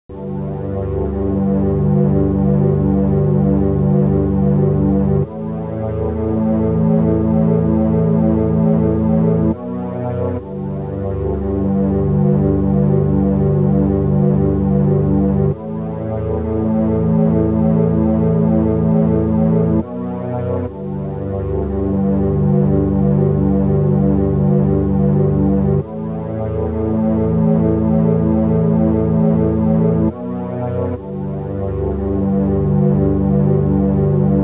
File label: radio; Milk outside a bag of milk... OST (2021)